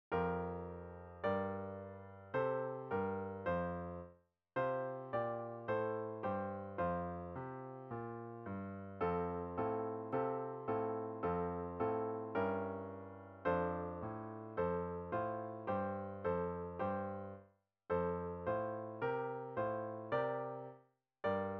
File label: Solo Four F Brass; first con 2024 stage 1 2 3